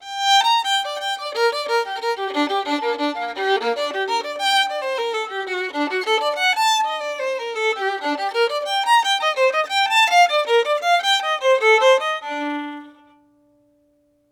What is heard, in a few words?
Violin FET